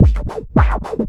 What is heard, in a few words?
FILTER, Big Beat Drumloops